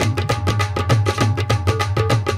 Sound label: Dhol; loops